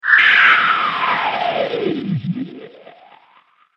Taken By The Wormhole, science fiction sound effect